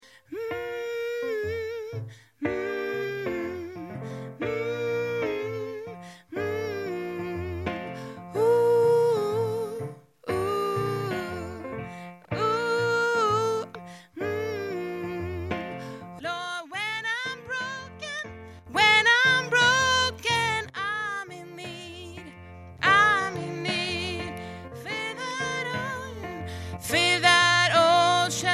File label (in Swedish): sopran